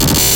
Freeze

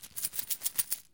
Coins In Plastic Bag

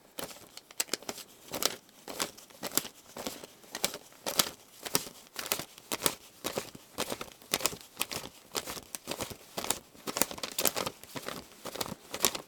Counting A Lot Of Money Manually

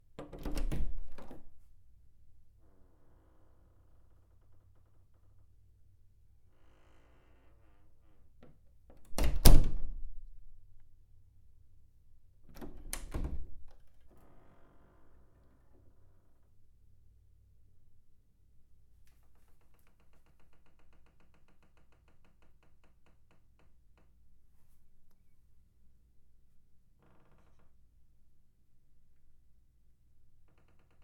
Opening Closing House Doors

